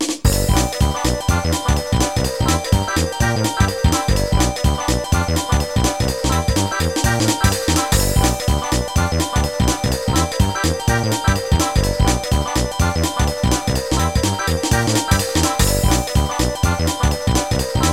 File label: Coin Slot; mario party 4 original soundtrack